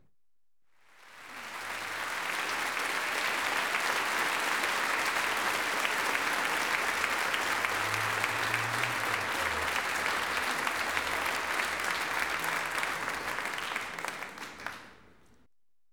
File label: CROWDS, General Applause - Ends; BBC Sound Effects Library; Schools & Crowds